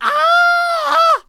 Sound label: Snake Archer Death Vocals